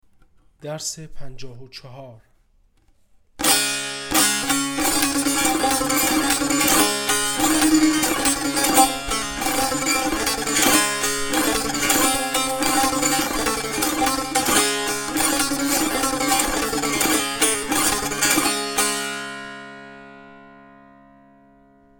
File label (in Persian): مشق تنبور